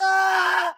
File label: despair scream; patchy